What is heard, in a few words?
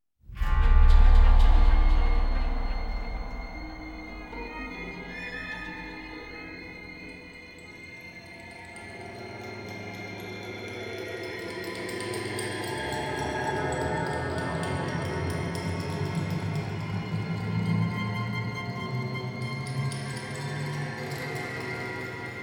bells with trumpet